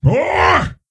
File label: Attack; King Ymiron